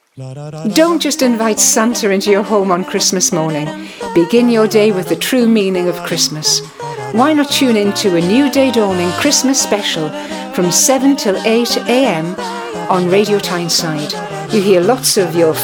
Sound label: christmas jingle; radio tyneside